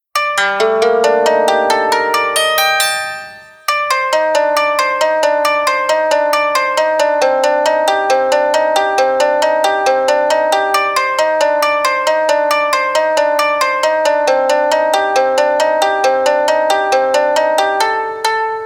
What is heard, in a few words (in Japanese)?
和風BGM